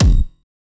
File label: Kick